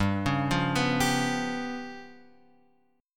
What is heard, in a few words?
G 7th Flat 5th, down, Guitar, Open F, G7b5 chord; arpeggiated